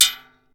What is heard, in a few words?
Shell 39mm metal; STALKER; IWP